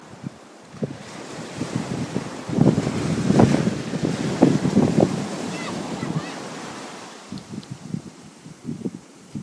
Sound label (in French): Plage; Ecosse; Stonehaven